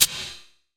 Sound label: Perc, Gated Reverb; creative gate; musicradar